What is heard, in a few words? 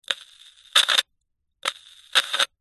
radio receiver or other receiver click hiss crackle no signal